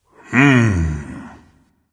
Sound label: thinking, voice; Smite; ymir skin12